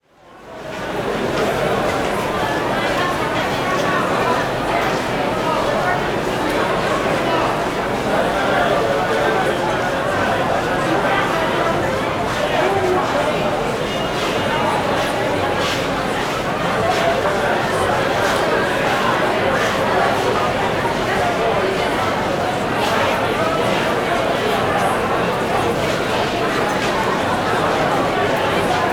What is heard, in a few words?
Crowd Indoor Open Corridor; Walla; Sound Ideas; Walla Series